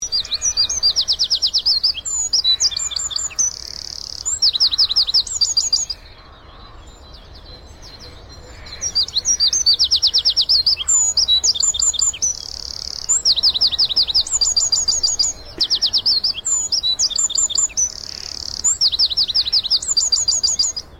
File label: wren, birdsong